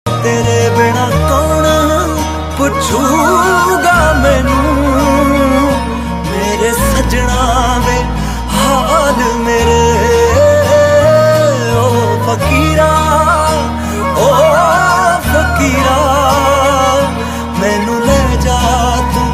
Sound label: punjabi song ringtone